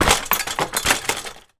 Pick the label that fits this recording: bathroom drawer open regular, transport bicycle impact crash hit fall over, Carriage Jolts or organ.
transport bicycle impact crash hit fall over